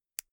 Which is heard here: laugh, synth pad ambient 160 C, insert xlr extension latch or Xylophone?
insert xlr extension latch